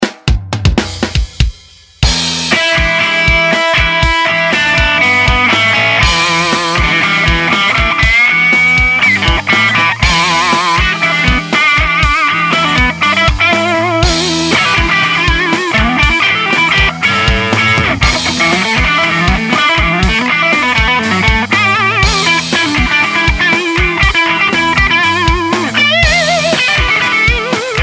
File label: Engator TOL50, Chords Up Mix; Voodoo Amps